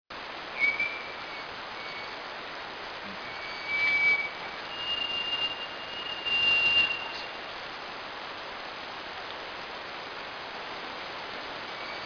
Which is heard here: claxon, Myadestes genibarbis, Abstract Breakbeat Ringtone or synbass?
Myadestes genibarbis